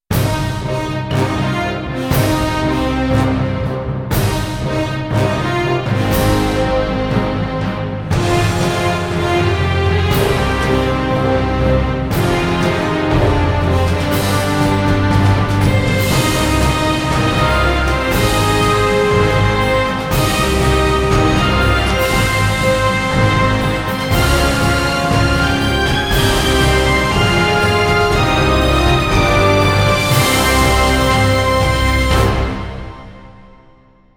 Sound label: victory